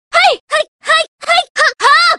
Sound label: hit; kasumi